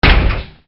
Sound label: Metal hit; AssaultCube; Alien Stars; American Muscle Series